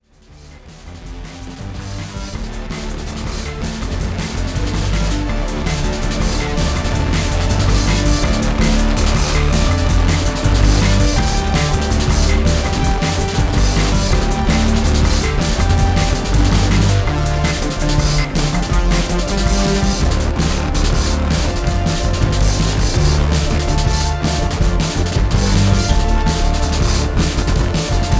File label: Bass